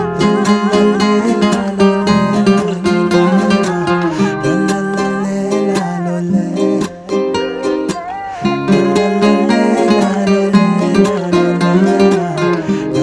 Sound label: Guitar